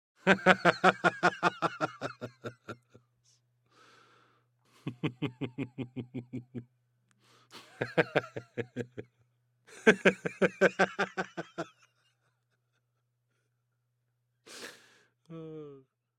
middle aged half hearted male laughter; fvtt sonniss gdc audio

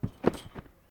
trampoline realistic

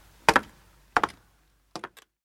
steps down; baileywiki maps premium towns

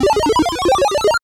8bit retro sfx traditional game starting; sonniss gdc

